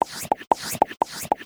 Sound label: popup silly